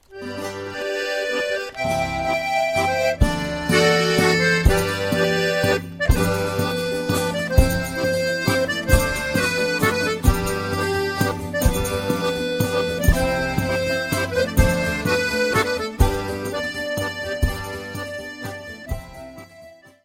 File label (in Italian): Pastorella Siciliana; Italie - Sicile